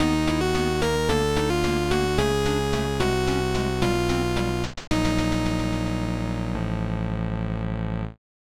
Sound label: Game Over